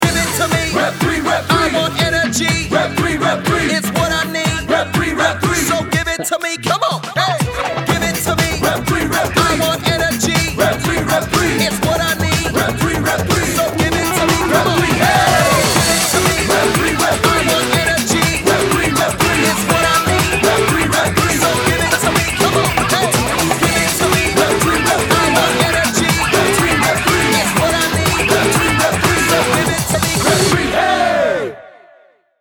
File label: ringtone